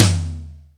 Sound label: Acoustic Tom, Yamaha DTXpress